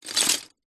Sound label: toy lego impact many pieces in box